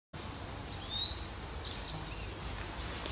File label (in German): rohrammer